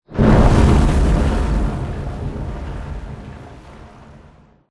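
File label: Explosion Rumble Interior Distant Texture; Boomtown; Titanfall 2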